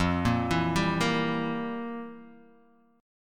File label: F Major 7th Suspended 2nd Suspended 4th, F, down, Guitar, Half Step Down